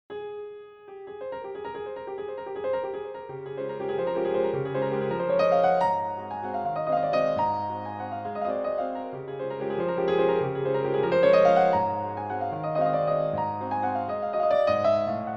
Chopin Waltz; Waltz in Db Major, Op. 64 No. 1 {Excerpt} (Chopin)

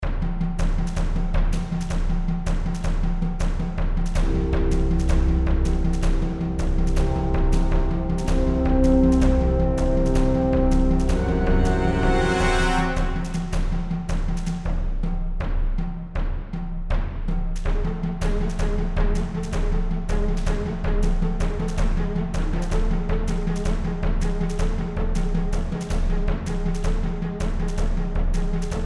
Action Suspense

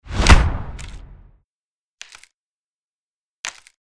Gut Eater Attack Heavy

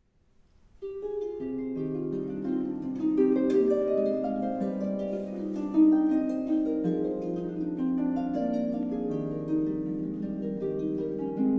Courante; French Suite No.2 BWV 813

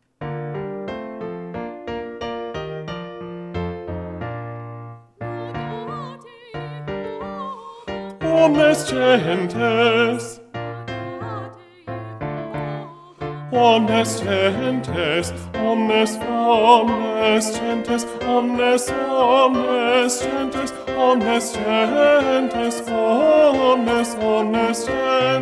Allegro - Bass; Laudate Jehovam 1 Allegro

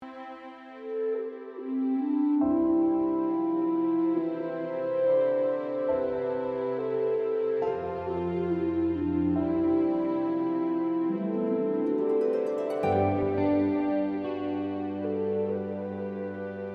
Slow, Orchestral and Clean Guitar, Romantic

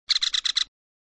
American Three Toed Woodpecker

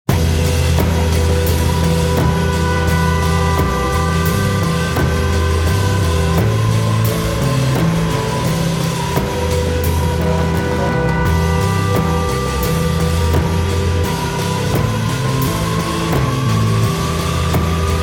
6-8 Acoustic plus low osc pad

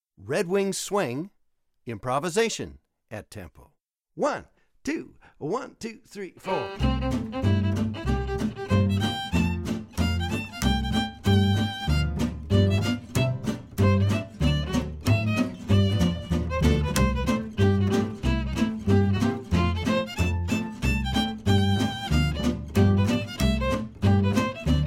Swing improv; Red Wing Swing, improv at tempo; Jeremy Cohen's Make Your Fiddle Sing! Park II

